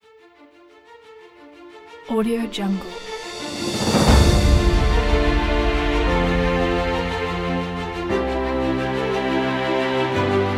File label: uplifting epic dramatic; alexshulgin